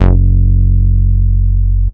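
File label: BASS OBMX, B6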